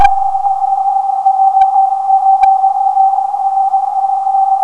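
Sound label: non-directional beacon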